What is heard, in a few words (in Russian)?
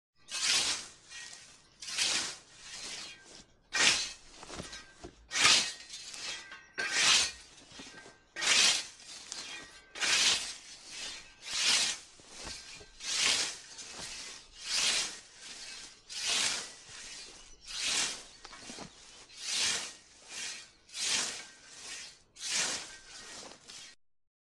zvuk kosy